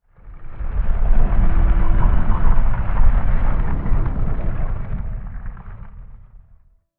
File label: lithoid moving out colossus; Stellaris; lithoids